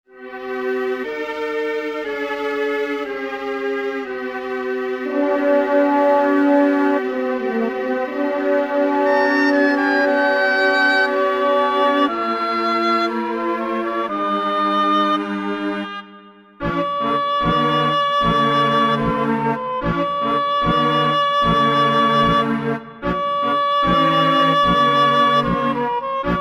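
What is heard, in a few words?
background music; Machi Unmei no Kosaten Tokubetsu Hen; PSP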